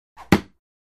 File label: Darts; Dart Throw With Feather Whoosh. Hits Target Hard.